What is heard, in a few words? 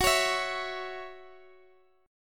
C sharp Minor Double Flat 5th F sharp; C#mbb5/F# strummed